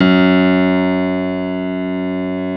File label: Grand piano F#1 (R); Giga Samples Collection